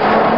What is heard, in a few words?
thonk; bugs bunny